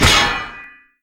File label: sword pullout